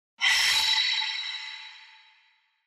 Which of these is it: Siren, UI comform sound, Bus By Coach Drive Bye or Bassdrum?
UI comform sound